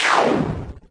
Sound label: laser, VIDEO GAMES; sound effects and noises; solarq